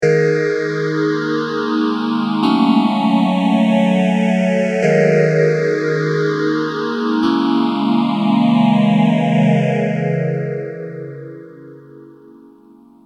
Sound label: m1000 boss ph3